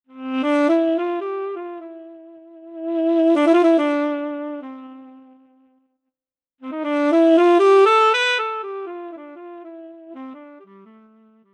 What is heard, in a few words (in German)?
Yamaha YDS-150 Tenor Saxophon